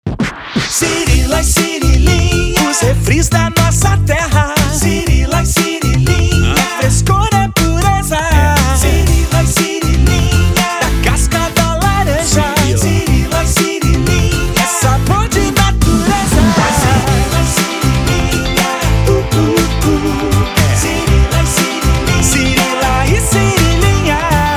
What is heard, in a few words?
Jingle